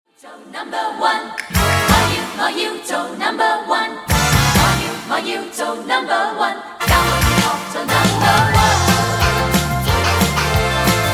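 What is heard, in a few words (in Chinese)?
Karaoke, 兒童詩歌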